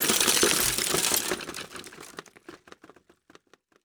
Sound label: small debris